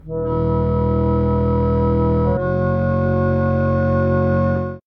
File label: bassoon